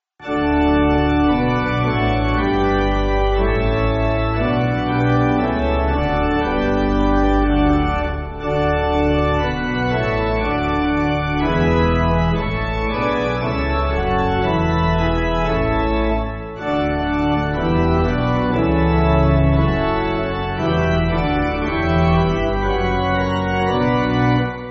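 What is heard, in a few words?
Pipe, Pipe Organ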